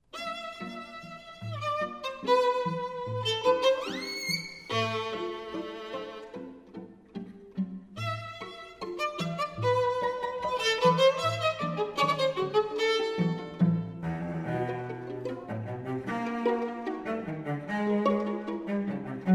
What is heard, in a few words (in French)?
Quatuor; Choveaux